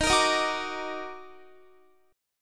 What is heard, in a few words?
D sharp Minor Major 7th, Guitar; strummed